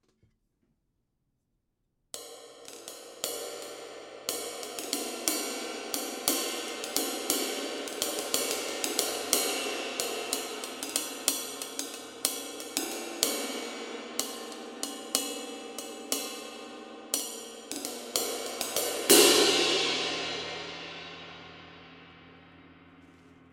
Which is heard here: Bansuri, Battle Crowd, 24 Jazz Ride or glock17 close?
24 Jazz Ride